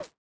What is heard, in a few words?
step stone